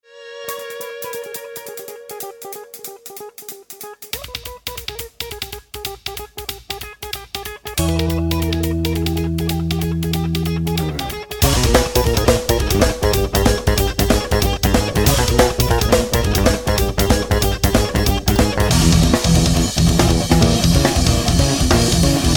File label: freak guitar